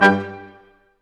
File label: BRS Quintet staccato, Symphony Orchestra; Roland LCDP08